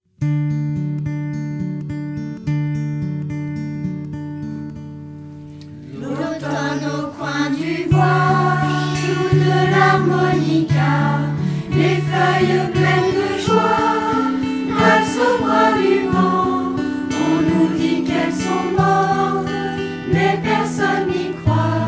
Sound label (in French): Chorale parents enfants; L'automne au coin du bois